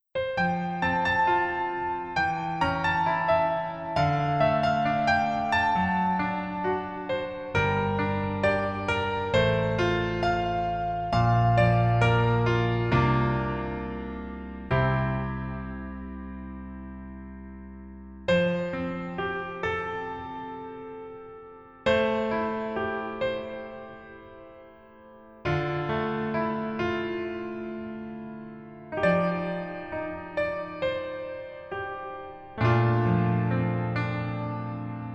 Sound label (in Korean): Piano ver -1키; 그 남자의 기억법